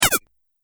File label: Laser, sci-fi laser sound effect; Laser Sound Sound Effects